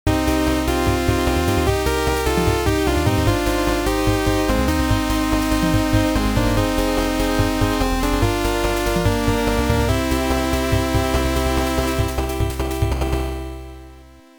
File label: Victory; Jimmu Denshou Yaksa; turbografx 16